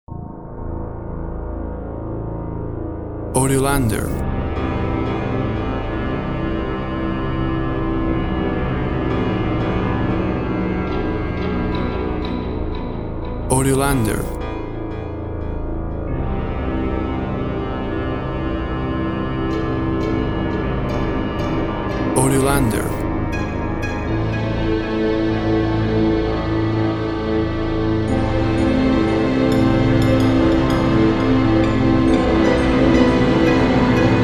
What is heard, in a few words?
Thriller Soundscape, An ambient thriller; An ambient thriller style soundscape with haunting low strings, and high pizzi